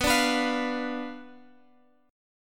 B Diminished B down Guitar Open G

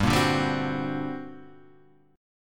G Minor Major 11th, GmM11 chord; GmM11 strummed; 3,1,0,0,1,2; JGuitar

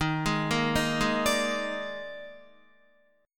Eb Major 7th Suspended 4th, Guitar; arpeggiated; EbM7sus4

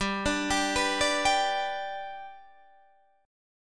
G Major, Guitar, Half Step Down

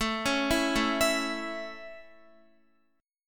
A Major A, Guitar; arpeggiated; x,12,11,9,9,11

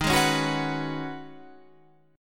C sharp 7th Suspended 2nd Suspended 4th D sharp

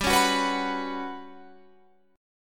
G Major 7th Flat 5th G down Guitar Drop D

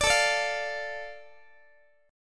A Suspended 4th Sharp 5th down Guitar; Asus4#5; strummed; chordshape; x,x,17,19,18,16

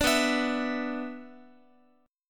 B Minor Double Flat 5th B Guitar, chord; Bmbb5 strummed; JGuitar

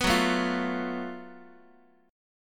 F sharp Minor Major 7th Double Flat 5th, Guitar; strummed

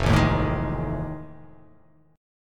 F 7th Sharp 9th

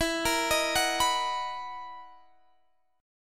B Major 7th E; arpeggiated

